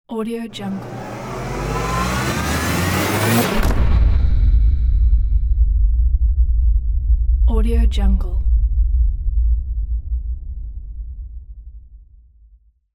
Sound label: low frequency takeoff